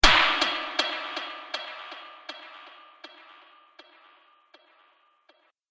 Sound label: Repeat That Hit; Miscellaneous Impact Sound Effects